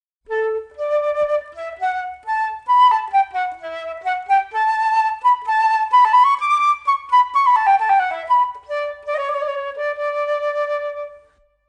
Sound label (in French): flute